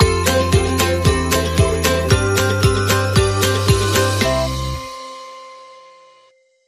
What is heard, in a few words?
Upbeat Acoustic Christmas Sting